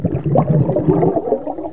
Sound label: player swim; xash3d fwgs